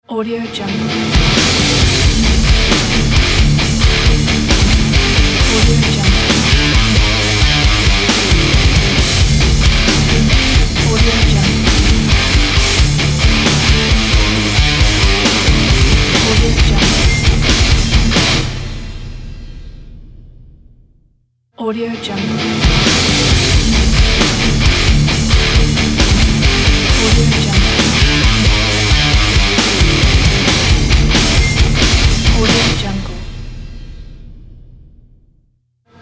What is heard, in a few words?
metal logo